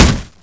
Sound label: wood, Bullet Impact